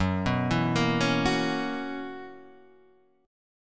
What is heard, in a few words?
B Major 7th Suspended 2nd Suspended 4th F sharp, chord shape